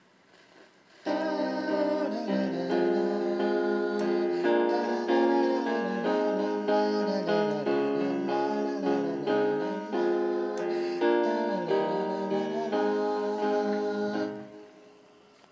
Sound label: melody Cm Fm Bb Bbm Ab Db Ab Fm Bb Eb Ab